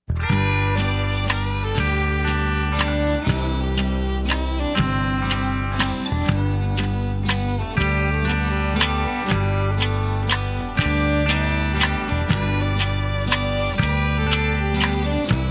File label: Sad Lovers Waltz